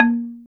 KULINTANG B3; Spectrasonics; Heart of Asia; KULINGTANG

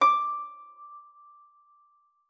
HARP FORTE C#6; Xsample VOL-8

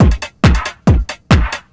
drm 138, techno drum loops

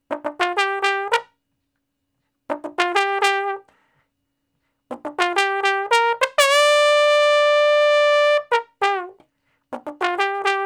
Trombone Straight (G); Crimson Blue and Fabulous Horncraft 4 RnB; Horncraft for R&B; Solos

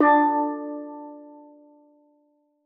steeldrum D3; Xsample VOL-4

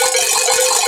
BELL MADNESS; E-MU Formula 4000 Series Vol. 3; Analog Odyssey; VK+ Instruments

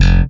Bass, pull F1; Sampleheads; Will Lee Bass Library

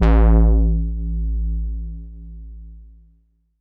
Wonky a2, Korg Poly-800

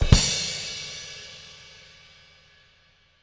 DBLCW KIK -L, Living Drums, SD CYMBALS; Sampleheads; Peter Erskine

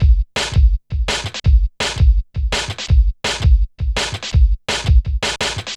Hard Stepz, Drum Loops 166.5; Zero-G Jungle Warfare 1, 2 & 3

